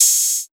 Old Skool House Open Hat

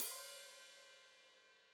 Hat Foot Open, Hi-Hat; Deeply Sampled Drums

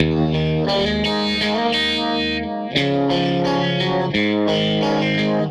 Tele Guitar Loop

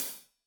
Hat Loose, Hi-Hat, Cymbals Stereo

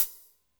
Cym Wet Hat, Drum Machines Alesis D4

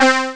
Mini Moog, Amiga Soundtracker; ST-XX Amiga Soundtracker Sample Packs

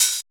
HH, Dance Mix

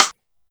RIM, Yamaha DD-6

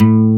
NYLON A 2; AKAI S6000 CD-ROM - Volume 1; NYLON GT

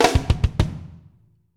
Fills; AMG; Now CD-ROM (Roland)

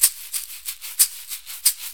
6-8 Caixi, Perc Loops; Drum Tools

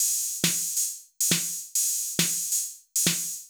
Beat, Old Skool House, 137bpm; Belfast

